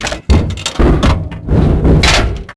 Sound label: coke can coming, coke machine; Max Payne